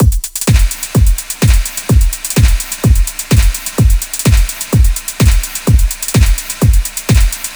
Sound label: Drum Loop Full 127